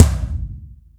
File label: TOMS; Zero-G; Chemical Beats